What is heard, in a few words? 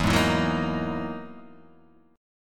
E-Major Sharp 11th E, Guitar Half Step Down